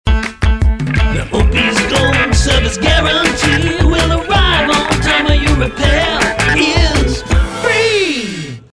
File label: Jingle; Opie's Gold; Service Guarantee; St. Cloud, MN